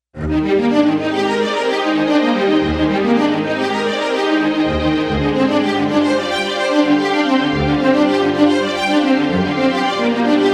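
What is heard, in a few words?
Strings ORCH ARPEG, synth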